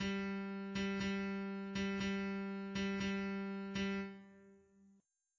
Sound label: bass3 bass1; orpheus